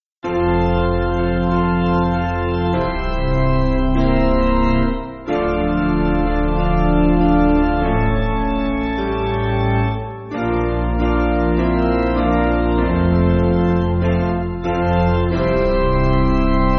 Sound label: Piano, Music Backing Files for Churches; In The Land; Sankey